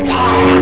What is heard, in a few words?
gaah; doom